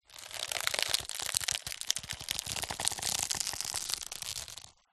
impacts egg box egg shells squeeze crack

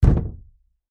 impact wood thump hit hollow

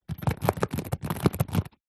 household purse childs empty shake; zapsplat

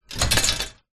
household mirror floor standing shake wobble

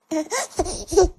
10month baby SMOOCH breathy laugh giggles; vox

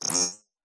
science fiction computer; Audio Mangler

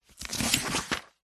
book workbook page turn; household

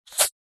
animals snake spit

